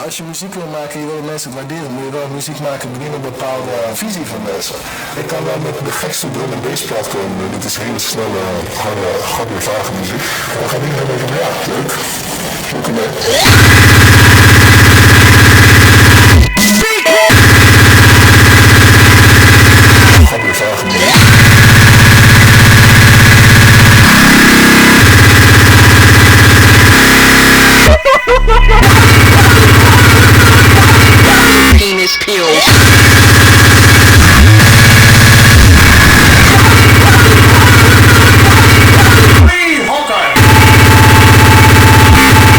STRANGE GABBER MUSIC

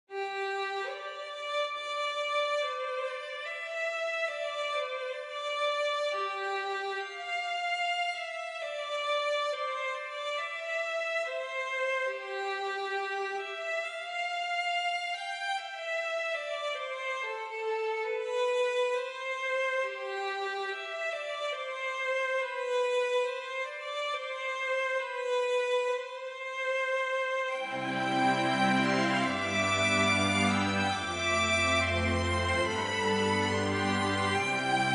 String Quartet

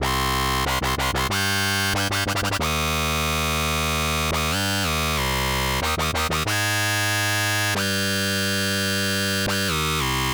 Bass 93bpm

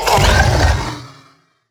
Monster; Zenless Zone Zero

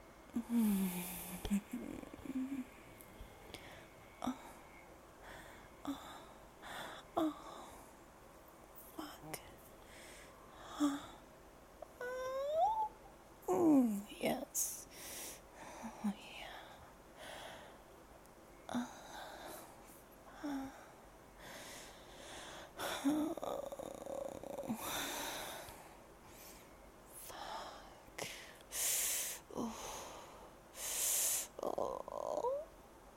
Whimpers, Giggles, Little pterodactyl noises; Careful With That Wand, Eugene

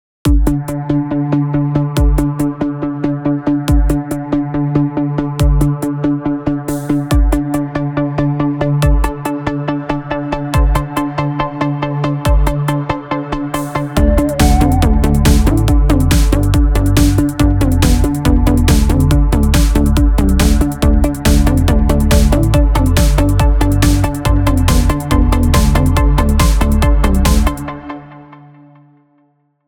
Melody

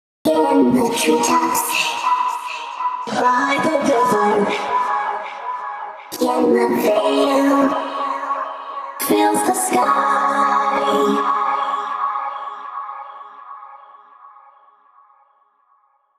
vox; fluttershy; Pony Fan Music Remix Stems

